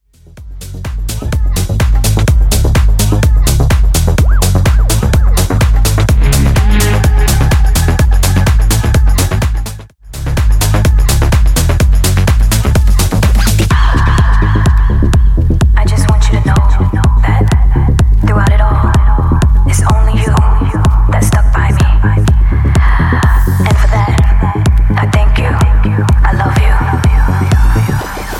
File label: Dance Re Drum 126BPM; SNIPPET